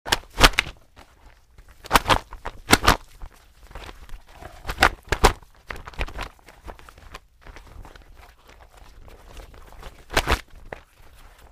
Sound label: Wet Chamois Flaps, cloth